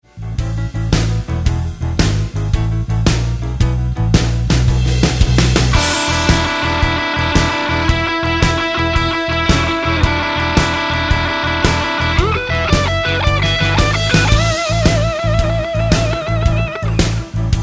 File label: Roadhouse Licks Lick, guitar; 20 Roadhouse Licks